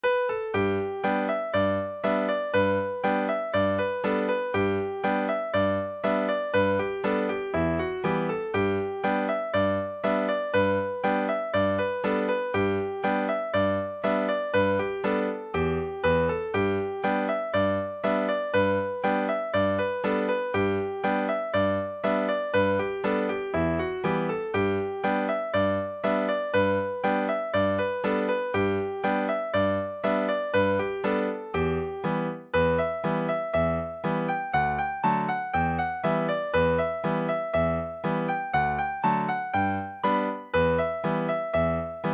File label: fling, session tune midis; pig town